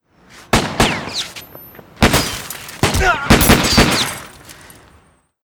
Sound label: SHOOTOUT